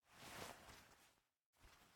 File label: Player Sit; miside demo windows gamerip 2023